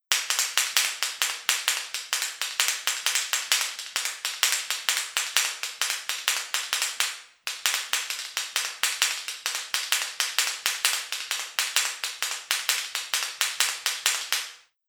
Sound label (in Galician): canaveira